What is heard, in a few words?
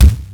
Bass Drum, Cwejman S1 MK2